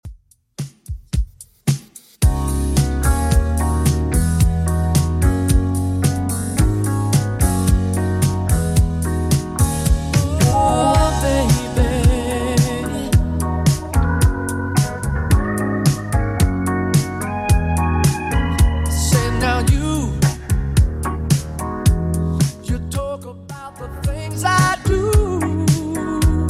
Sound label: 80s Redrum 110 bpm CLEAN